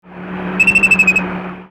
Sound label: Hyalinobatrachium colymbiphyllum